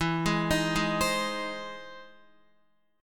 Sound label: E Augmented Major 7th E down Guitar 4F 4A 5C 5G 5C 6E; arpeggiated